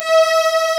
STRG A4; East West; Global Sounds

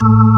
ORG, Organs

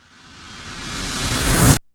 IMP RUSH, Cinematic Impact; M-Audio Pro Sessions; Liquid Cinema

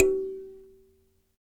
Dyna Sansa; Roland LCDP11 Africa VOL-1; Sansas

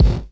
BD, Dance Drums

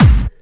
hard bass drumz

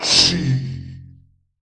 CHEEE, TUBEVOX DRY; Q Up Arts; Denny Jaeger Private Collection

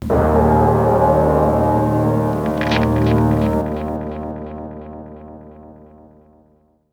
FX, Drum & Bass

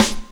SD, HIP, Dance Mega Drums

